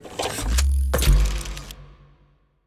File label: Reload; Starbites; Zerom